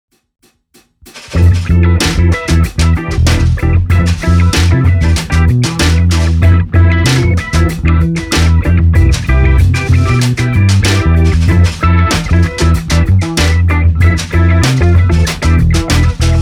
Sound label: Jazzy 95bpm remix